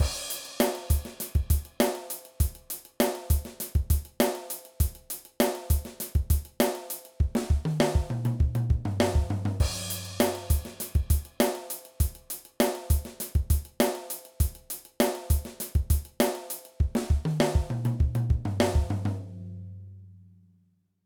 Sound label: Pocket Fill